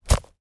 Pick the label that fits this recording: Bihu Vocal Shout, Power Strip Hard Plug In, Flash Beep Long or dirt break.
dirt break